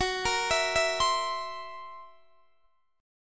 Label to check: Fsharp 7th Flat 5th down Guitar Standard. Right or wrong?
Right